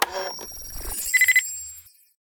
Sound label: can calibrate; ships salvaging; CosmicRage